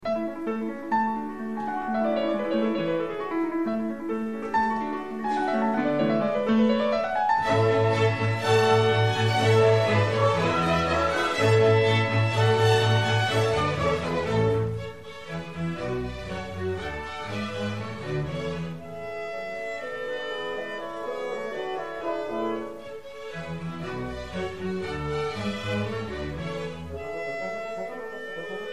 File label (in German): Konzert Klavier Orchester A Dur; W. A. Mozart; Konzert für Klavier und Orchester A-Dur; Gunskirchen